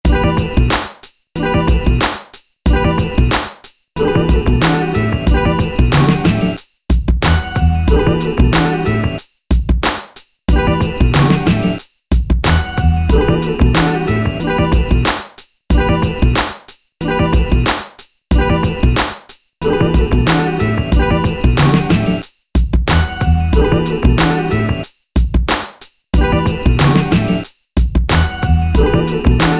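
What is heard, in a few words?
Motown Instrumental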